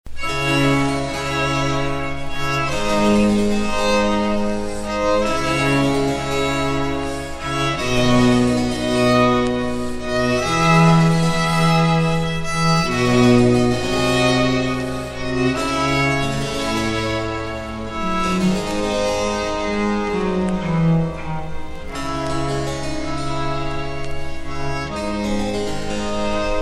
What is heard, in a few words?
trio sonata; Baroque